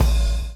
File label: BLOW; Spectrasonics - Backbeat